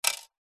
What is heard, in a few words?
single small stone drop on metal; foley